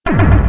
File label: hit, laser; weapon